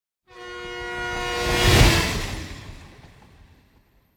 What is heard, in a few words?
Train Whistle By European - L to R; Hollywood Edge; sound designer's toolkit v2